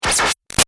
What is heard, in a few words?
Shot Laser Pump Delay; Sound Design; Big Room Sound